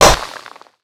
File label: concrete impact, Bullet Impact; Dystopia; physics